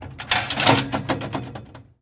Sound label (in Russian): zvuki turniketa